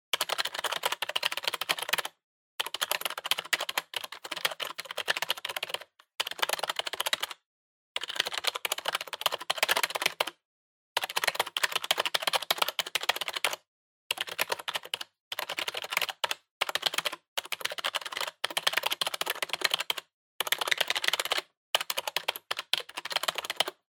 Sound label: PC-Keyboard (Hard)